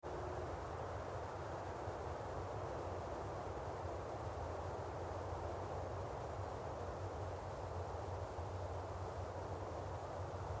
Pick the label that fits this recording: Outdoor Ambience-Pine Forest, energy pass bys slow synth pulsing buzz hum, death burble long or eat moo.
Outdoor Ambience-Pine Forest